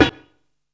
picy, snares; Zero-G; Funky Elements

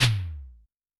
E Tom1, Yamaha DTXpress-II

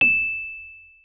Rhodes MK1 E7; Xsample VOL-1

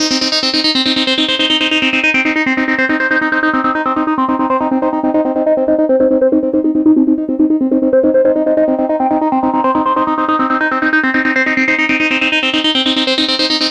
NRG Sweep, Trance; Sonic Foundry (Sony Creative Software); Trance NRG VOL-1; Sweeps

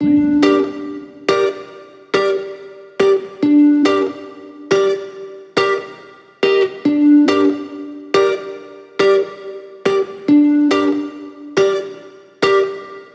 140BPM Gmin Guitar FX, Offbeat Dub Guitars; Antidote Advent

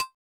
Cowbell, Genesis; Urban Flow

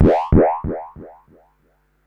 Perc Synth Moog Delay

